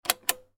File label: button click